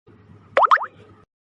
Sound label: notification; redmi 8a dual